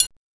TRIANGEL; drum; PERCUSSION

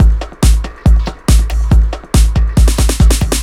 TECHNO BEATS 140

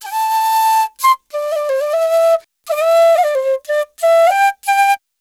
ETHNO EXTRA 90; ethno2

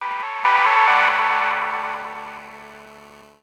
KEY SHOT TEKNO 140 A; Techno2; Singleshots

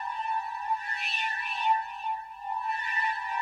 SPACE FX 140; SpaceSounds

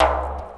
lick, ETHNIC STUFF

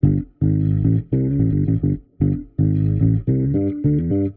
Warped Bass, 110 A; Loops; Funk Alicious; Touch Loops; CosmicFunk

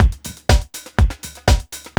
STRAIGHT 122, loop; 122-146 BPM

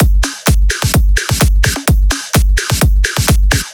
drum loop 128 Full; Electro; drum loops; Anthemic

